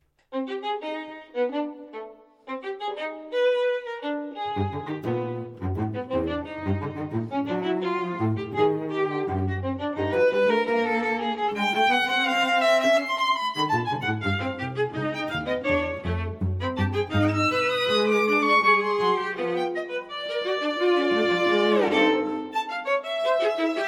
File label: String Trio